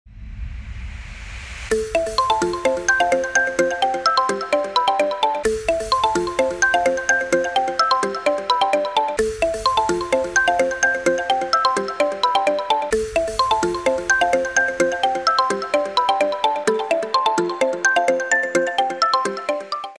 electronic

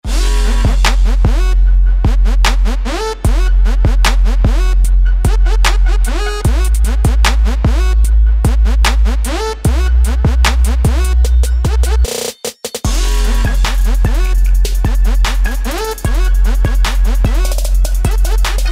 pump that bass

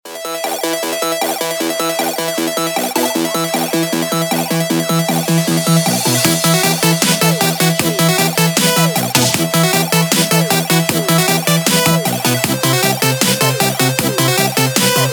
keygen

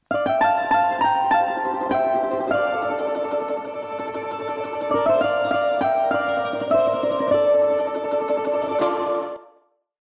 balalayka sad song; badcomedian

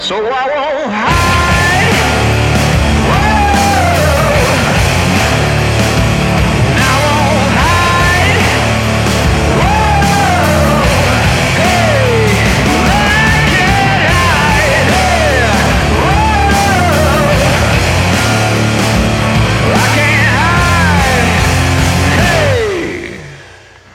blues

